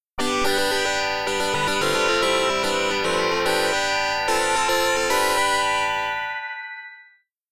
Try For Point Success

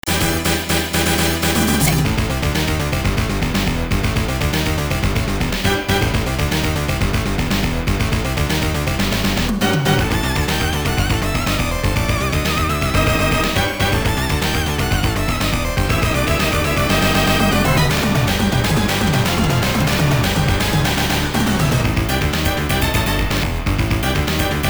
Boss Fight

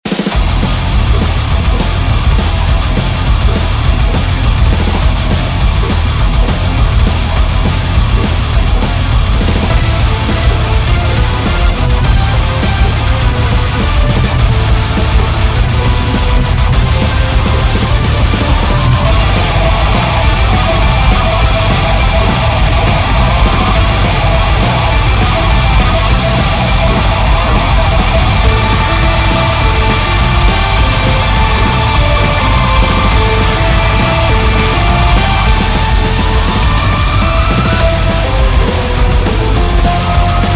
BGM boss battle

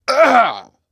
worm death

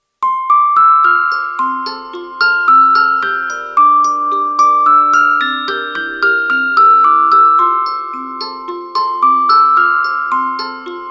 Orgel Happy Birth Day Song; tree of savior

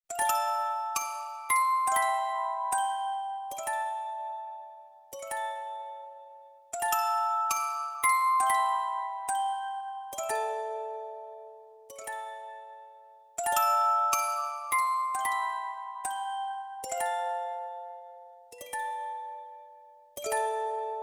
My feelings become the wind (orgel); Samurai Jupiter

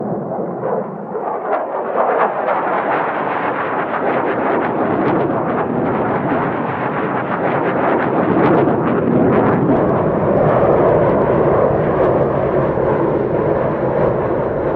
Ext Wind; Criminality

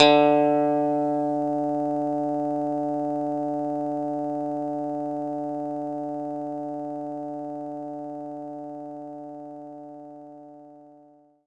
Clean Guitar D3

